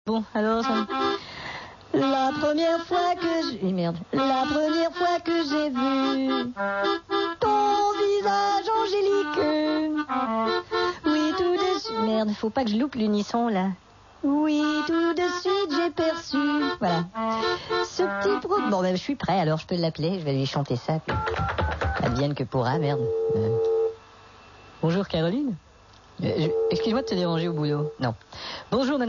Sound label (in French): Accordéon; roger et caroline